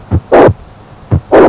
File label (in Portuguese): regur mitral sopro atrasado, sons cardiacos